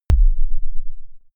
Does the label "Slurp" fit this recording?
No